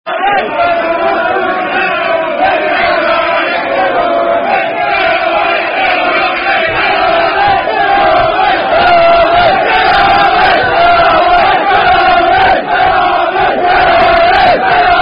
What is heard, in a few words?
Red Army Football Chant